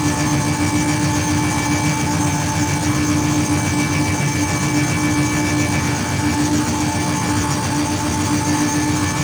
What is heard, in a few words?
Race Mode Ship Loop; Warframe; Ambience; Space Battles